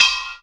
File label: METL GT, DRUMS EFX